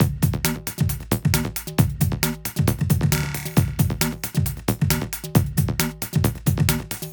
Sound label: neuron pulse groove; FRENETIC FRACTAL GROOVES